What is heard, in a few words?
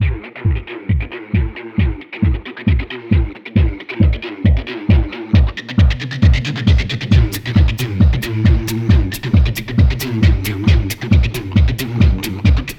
Race Loop; Music; Crash Tag Team Racing